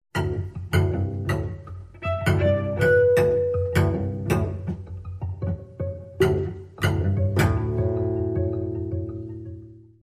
Cello Suite 2 Variation 3

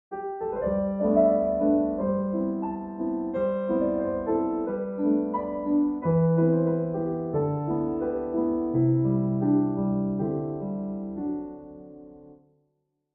Underscore

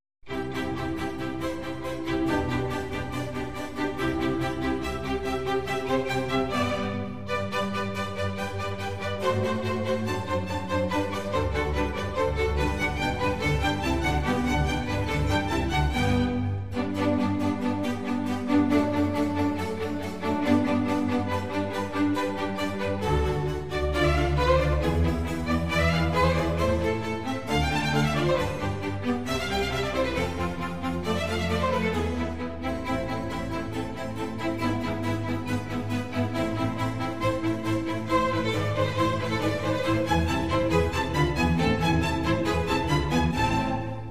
Concerto for Strings in C major; RV109; Antonio Vivaldi

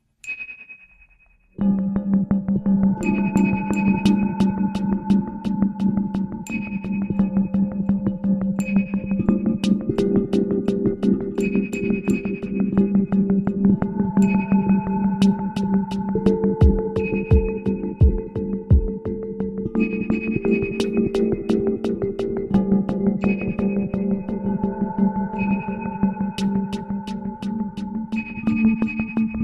Ambient Hush

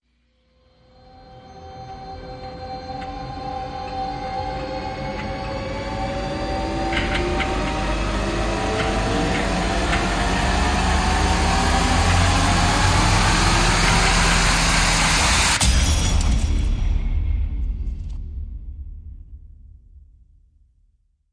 rise long; Infantry

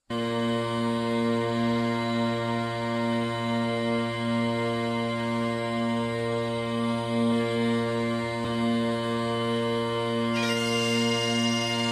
No Perc

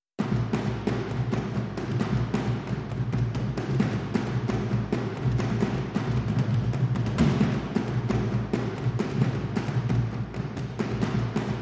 Loop Layer 3 Dhol

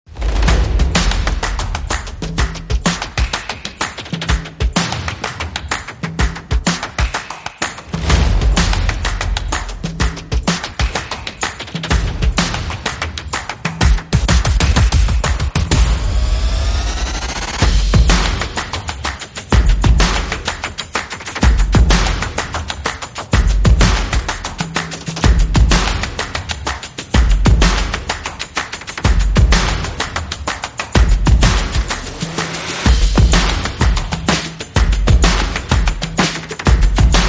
No Snare Rolls

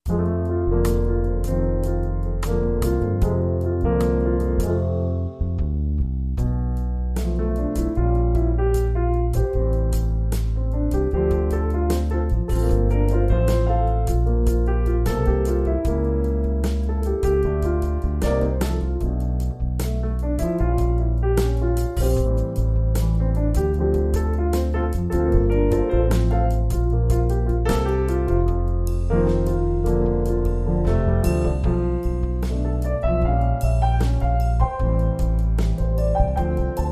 With Trio; 60sec

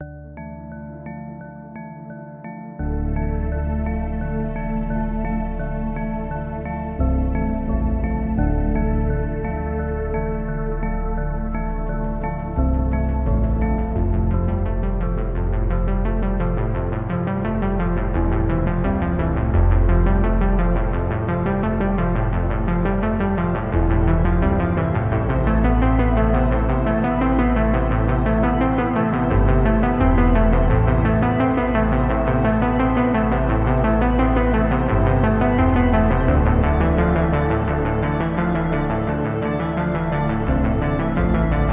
Synth Stem

